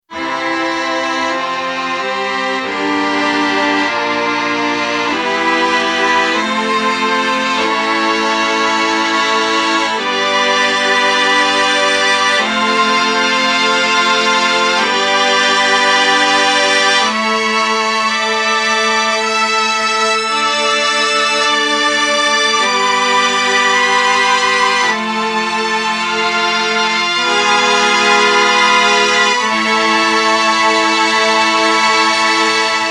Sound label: Strings